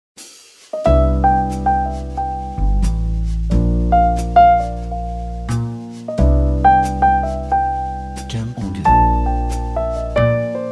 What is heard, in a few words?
Jazz Piano Slow